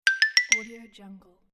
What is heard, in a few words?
xylophone success